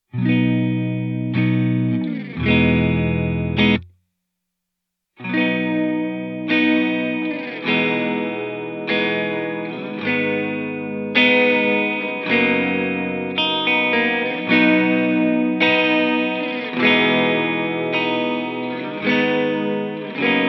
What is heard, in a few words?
LoFi Warble Chords, reverb